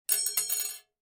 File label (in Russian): zvuki viazaniia